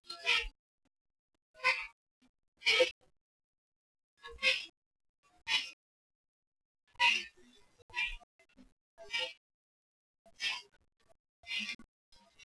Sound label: Krik male, loris